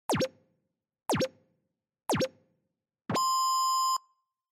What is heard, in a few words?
Countdown